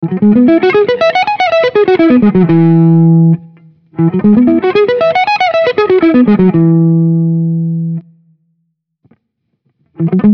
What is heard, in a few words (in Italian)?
Sweep Mi